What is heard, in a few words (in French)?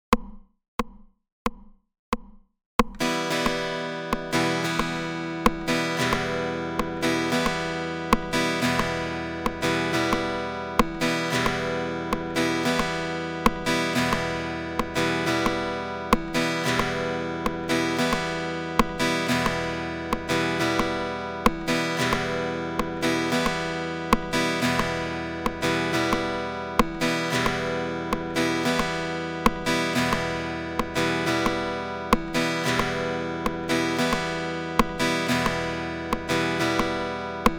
Deux flèches vers le haut